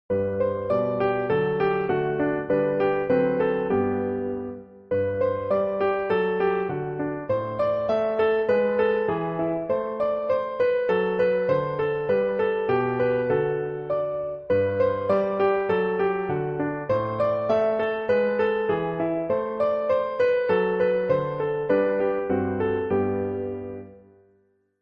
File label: Stepping Stones Accompaniment Track